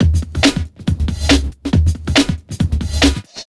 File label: 140, Big Beat Drumloops; USB Soundscan vol.25; AKAI